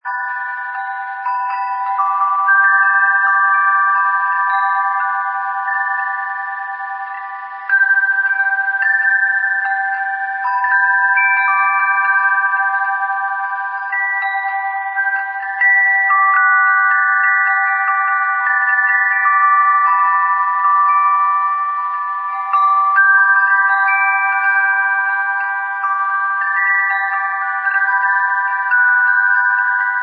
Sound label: summer wind chime